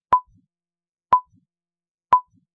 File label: countdown